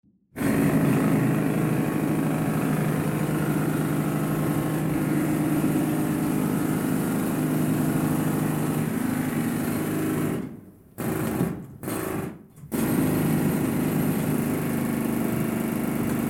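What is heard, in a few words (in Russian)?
длительно долбит перфоратор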